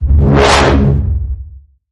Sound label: Bend The Bassline C sharp